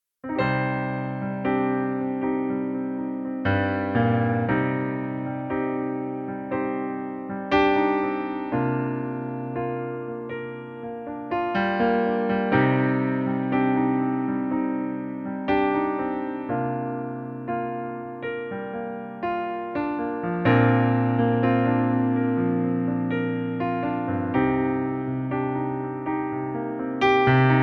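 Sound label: piano playback C minor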